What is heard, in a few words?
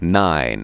vox; Ueberschall; Techno Trance Essentials